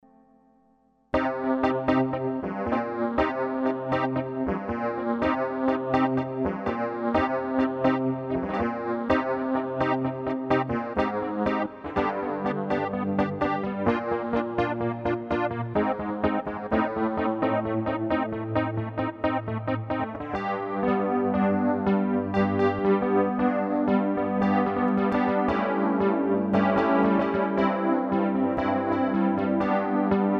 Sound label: Wurlitzer